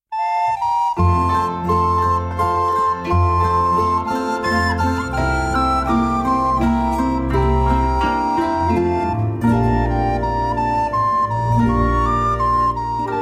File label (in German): menuett